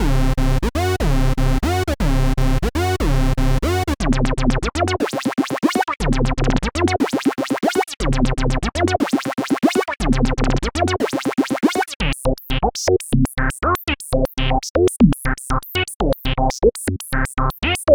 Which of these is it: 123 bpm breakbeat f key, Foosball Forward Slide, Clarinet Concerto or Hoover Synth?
Hoover Synth